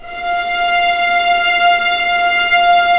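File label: F3 legato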